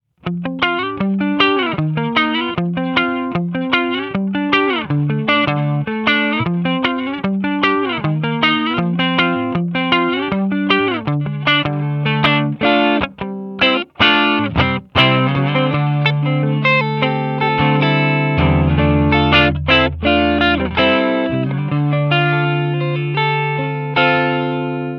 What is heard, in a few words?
soft and clean